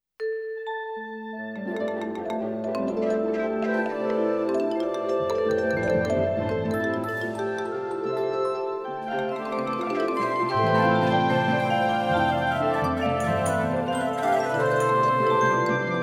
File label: Orchestra Tune-Up